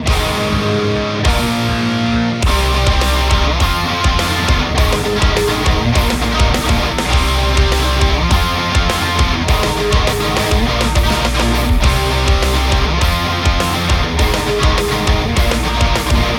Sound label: rock